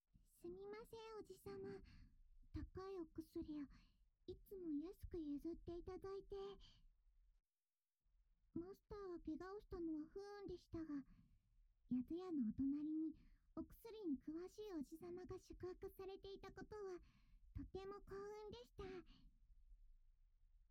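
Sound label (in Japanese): キス手コキ（壁越し; 催眠をかける。キス手コキをさせる（壁越しver）; バブみたっぷりのエルフママ・リーリエちゃんが、NTR精液でほんとのママになっちゃうお話; 壁越NRTフォーリー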